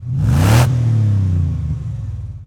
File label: Truck Explorer Rev; SFX Kit; Trucks